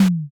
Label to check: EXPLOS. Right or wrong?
Wrong